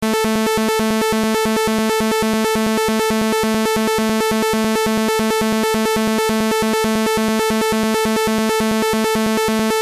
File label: Golden String; Fibonacci